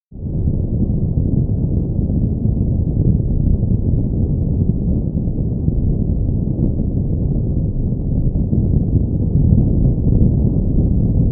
Feuer weiter weg

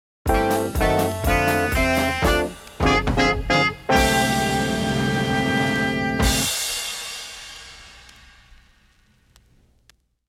Musikeffekte Beckenschlag

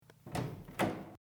WC Tuer oeffnen

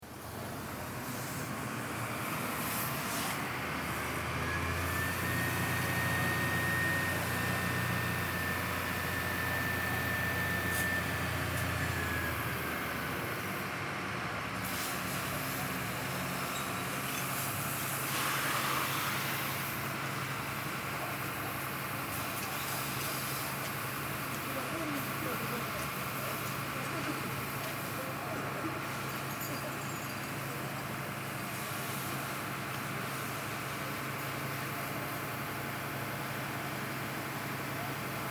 Silvester Feuerwehr

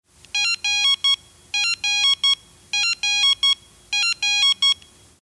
Pieper

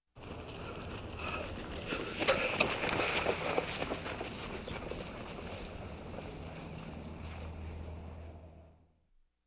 Sport Jogger Auf Wiese

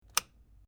Schalter

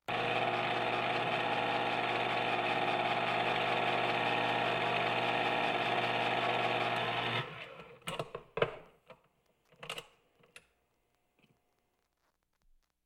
In Der Kueche Elektrischer Dosenoeffner

